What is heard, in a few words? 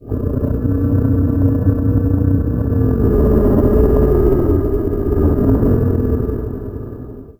Deep Vocals; Sonic Foundry (Sony Creative Software); Loop Noir